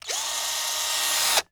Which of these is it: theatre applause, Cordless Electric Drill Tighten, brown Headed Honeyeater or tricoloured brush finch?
Cordless Electric Drill Tighten